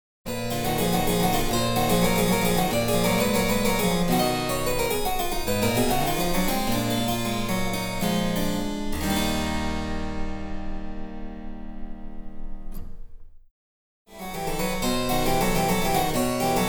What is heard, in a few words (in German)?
Cembalo; Mark Lindley WTC 1